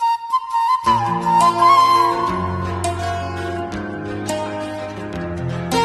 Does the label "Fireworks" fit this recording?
No